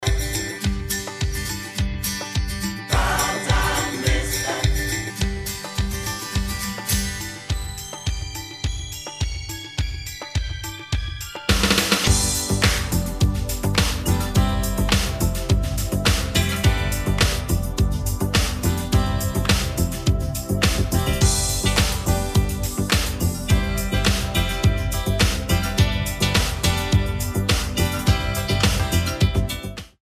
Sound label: Karaoke Song